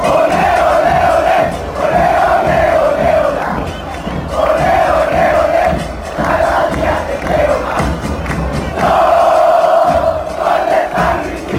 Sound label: clap, chants; san luis